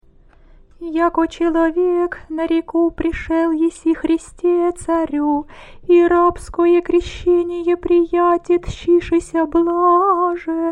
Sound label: theophany water stichera, singing